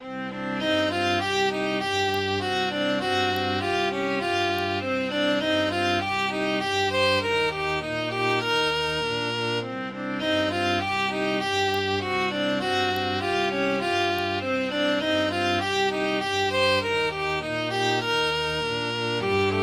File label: string trio